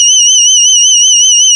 LEAD, Nord; Wizoo - Powered Nord; LEAD PAD HRN

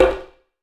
KO DAIKO, TAIKO; AKAI S-Series CD-ROM Sound Library VOL-5

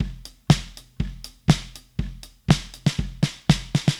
Drums, 70s Analog Funk; Sonic Foundry (Sony Creative Software); What it Is!; Classic Caddy

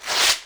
TEAR UP, Anime Sound FX

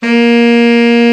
BRA TENOR, SAX; Best Service Gigapack 1; BR SAX 4

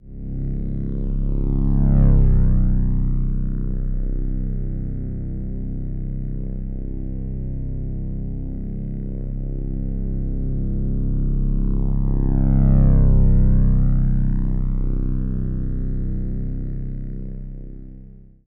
AIRCRAFT; AKAI Anime Sound FX; NATURE

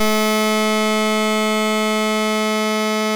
EMU MODULAR, modular syn

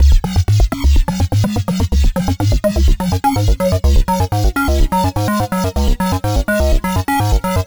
C# 125, Synth Loops 125bpm; Zero-G; Soundclash Nu Dance Electro; Acidized

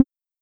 Tom Hi; Drums

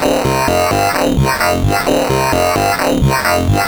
MiniBrute Loop, 130BPM

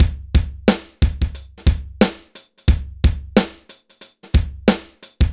BPM 90 DRY

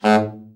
TENOR SN, Sax; Giga Samples Collection

